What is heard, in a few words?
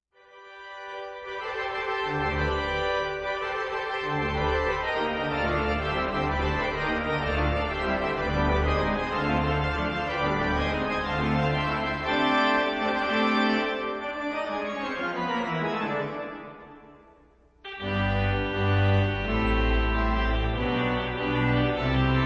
Fanfares